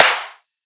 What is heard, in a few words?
Main Clap; Drums; One Shots; Claps; House